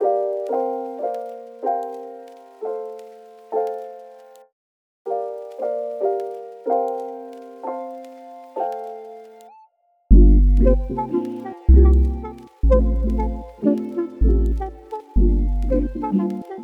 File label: cute loop quickly neo soul chop sample Dmin, 95